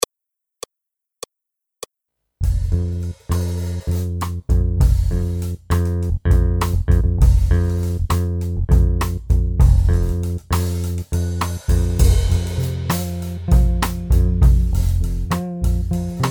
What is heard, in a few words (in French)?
Pedal point Accompagnement seul; Jazz